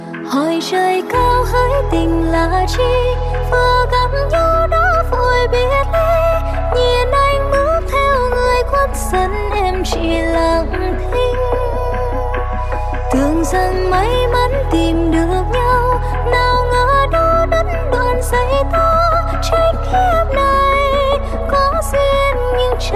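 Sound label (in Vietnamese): hoi troi cao hoi tinh la chi giong nu, female voice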